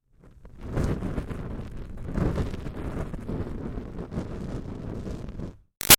Fire Torch Burn in Wind Short; War Sound Effects; Big Room Sound

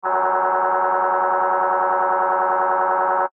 Sci Fi Laser Beam Ray Stream; Lasers

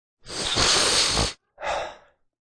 Big Sniff Exhl; One sniff or sniffle.; Sniffling (Sound Effects:Human)

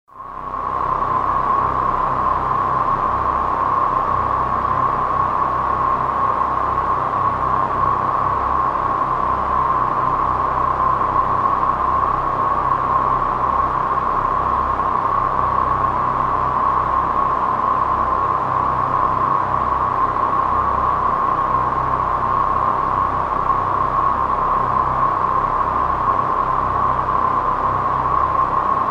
Spaceship, Spaceship: Ambience

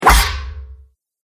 Long Sword Hitting Plate Mail

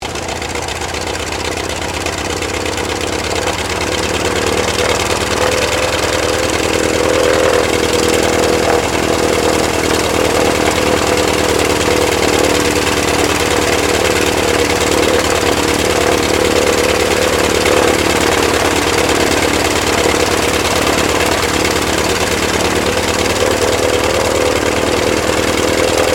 AIRCRAFT CA-28 CERES PREFLIGHT; Airplane Sound Effects; Airplanes (Sound Effects:Vehicles)

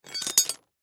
Pipe Pick Up Rocks Tool Wrench; Machinery Sound Effects; Foley

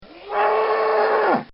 Longhern Steer Bellow; Cows; Animals; Bobby Cole

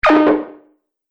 Multimedia Button; Business Programs, Apps And Computing Noises

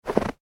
human footstep snow; Single Footstep On Snow; McKinney Sound

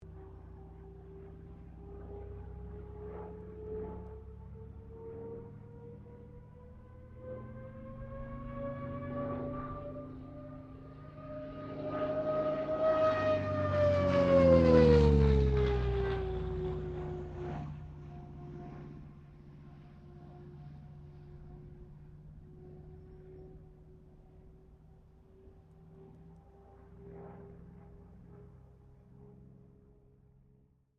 AIRCRAFT CA-13 BOOMERANG PASS

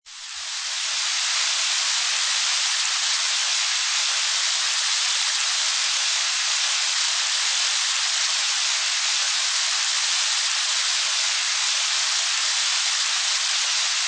Rain, Recording of rain shower; Rain (Sound Effects:Weather)

